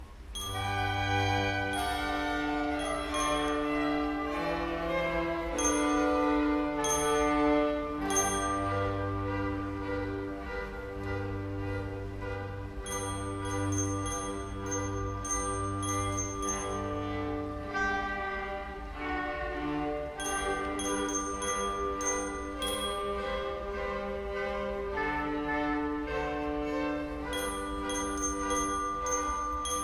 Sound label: Junges Ensemble Traditional Joy To The World; Konzertmitschnitt vom 19. Dezember 2023